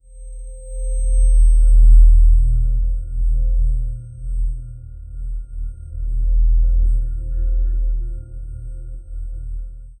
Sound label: a soft eerie magical hum, celestial